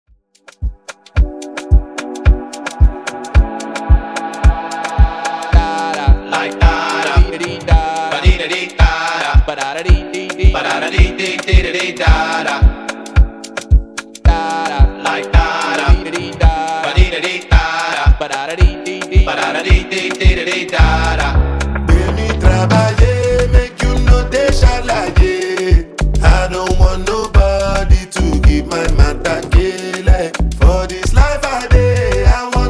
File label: Afrobeat Extended